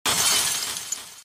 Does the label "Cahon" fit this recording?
No